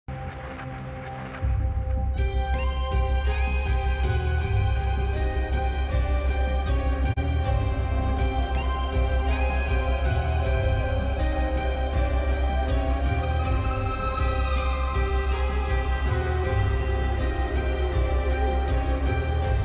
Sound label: bgm ringtone; iraivan movie; south movie